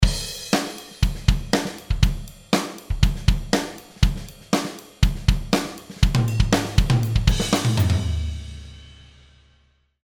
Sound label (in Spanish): Midi con Superior Drummer